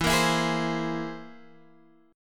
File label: C Augmented 7th E